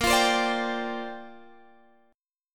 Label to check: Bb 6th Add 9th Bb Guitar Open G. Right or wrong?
Right